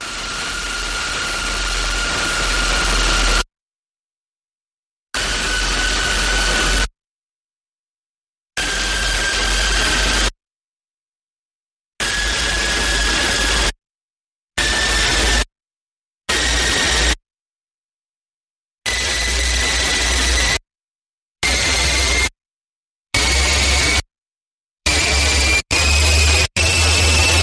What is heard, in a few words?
Rise Long Sputtering